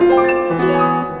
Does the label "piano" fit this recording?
Yes